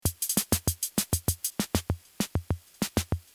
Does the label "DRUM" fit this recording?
Yes